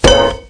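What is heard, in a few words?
grass; Mafie 1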